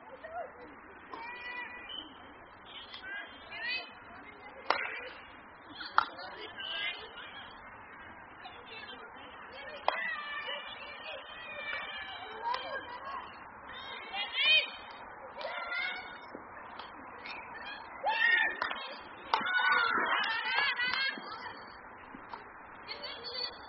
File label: Kids Cricket; Sport